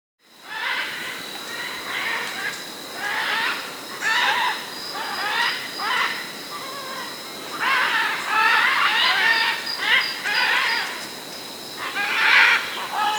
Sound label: Military Macaw (Ara Militaris), Andean Birds; S. America